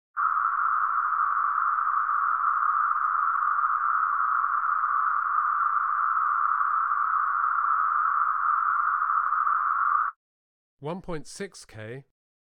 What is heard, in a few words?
12.5kHz; Alan Parsons & Stephen Court - Sound Check 1993